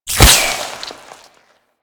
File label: Infested Face Hugger Fire; Warframe